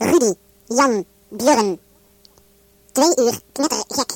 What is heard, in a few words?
Jingle; Knettergek en namen